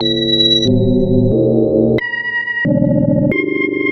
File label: Pin Hit Shifter, Synth SFX; Sonic Foundry (Sony Creative Software); The Electronic Music Manuscript Collection